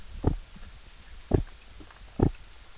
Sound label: Ameiurus nebulosus, soniferous; 30June2004 Tivoli Bay